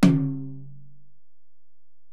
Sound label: Tom, Alesis DM-PRO